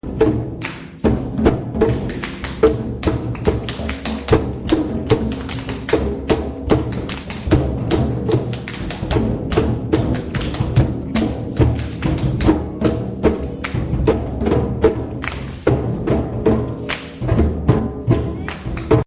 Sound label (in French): anim tambour enfant